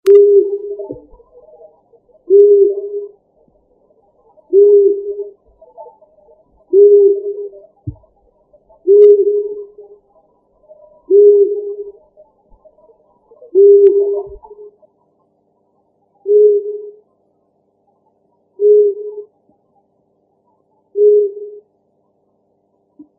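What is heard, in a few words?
long-eared owl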